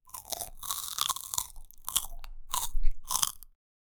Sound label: crunchy bite and soft che, chewing